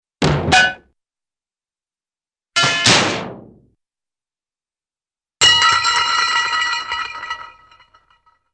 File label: Bounce Then Metallic Bonk Clang Spin, cartoon; Hollywood Edge; Cartoon Trax